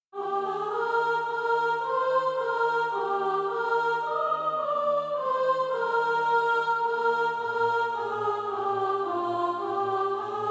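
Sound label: soprano, Practice Music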